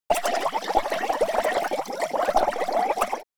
Cartoon air bubble water surface

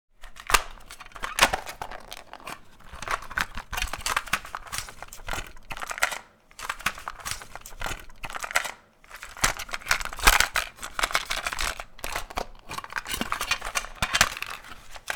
Cassette tape handling rattle close perspective

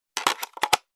Cassette deck player portable insert tape

